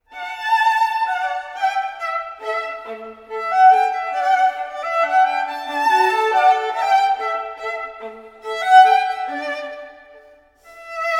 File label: 2 violin duo; string quartet; Mozart Duo 2